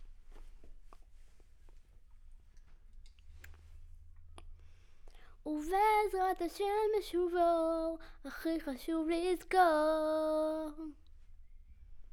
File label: Children Choir; Studio Bekol Children Choir